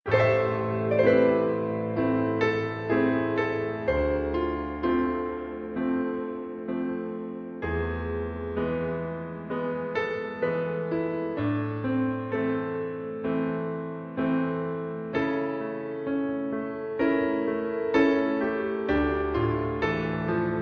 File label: bgm